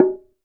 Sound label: TANG KU, KOREAN DRUMS; AKAI S6000 CD-ROM; WorldSounds